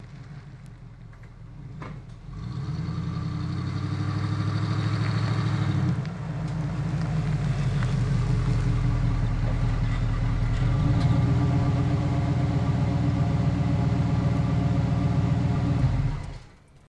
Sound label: Blazer Backs Up Idles, chevy blazer